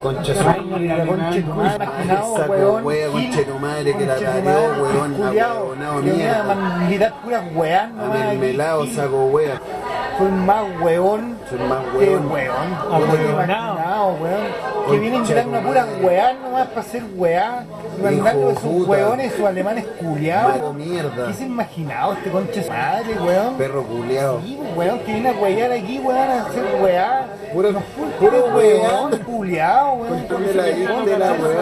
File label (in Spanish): radio ruido garabatos